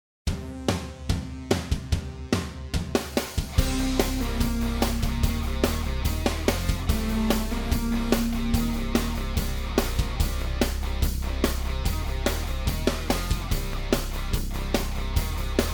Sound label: BACKING